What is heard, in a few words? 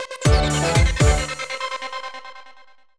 Jig; eigo de tabisuru little charo